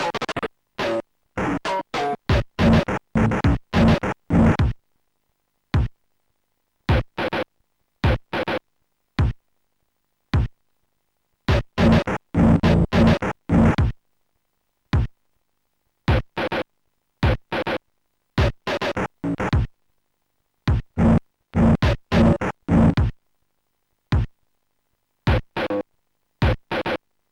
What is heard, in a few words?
hard rock reggae; Sonix Music Driver